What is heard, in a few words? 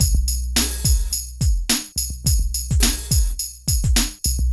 PHATT BEA, Hip Hop; E-MU Formula 4000 Series Vol. 1; Hip Hop Nation